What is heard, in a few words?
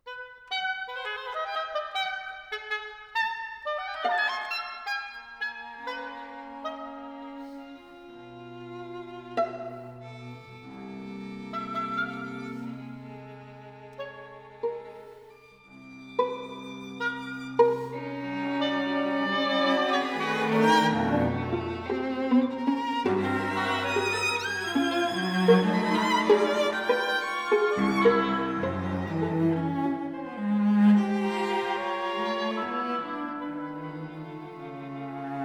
oboe quartet